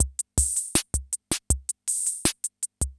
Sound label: CR78; Best Service; XX Large Most Wanted